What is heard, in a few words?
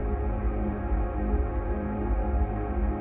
Dark Pad